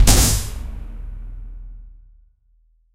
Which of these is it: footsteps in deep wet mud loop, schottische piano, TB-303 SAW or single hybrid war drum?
single hybrid war drum